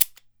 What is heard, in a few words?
CABASA, Brazil Drum Perc